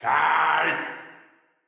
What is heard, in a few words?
INTIMIDATE Grunt